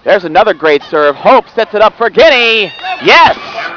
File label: vball